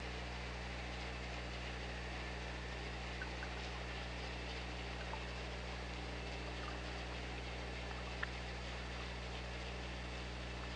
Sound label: OrcaLab